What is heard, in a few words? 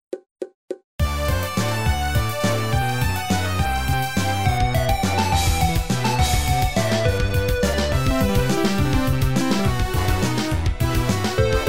game music